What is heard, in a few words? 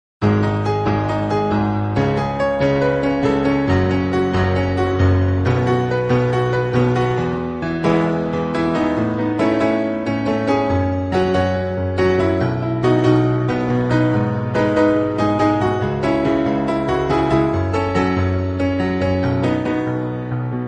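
instrumental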